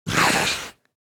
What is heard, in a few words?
Animal creature monster pain hit